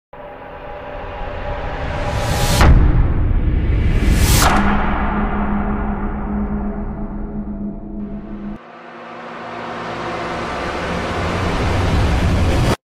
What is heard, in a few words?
Time change transition